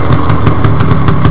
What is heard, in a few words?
moped